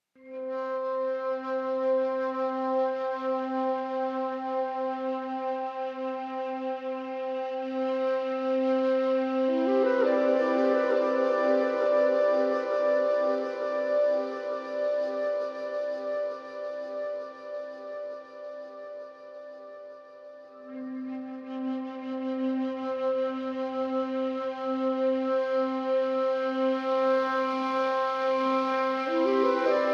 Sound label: Flute with Echo